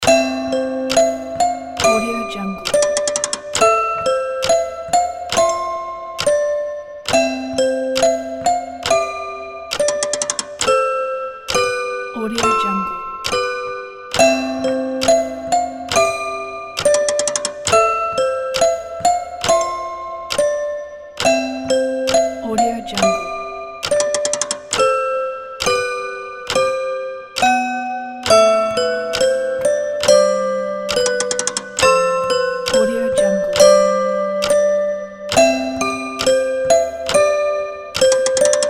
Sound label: music box; audiogong